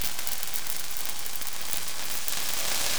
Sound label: loop, techno atmos